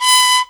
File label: HUNT PIPE, ZAIRE PIPE; Spectrasonics; Heart of Africa 1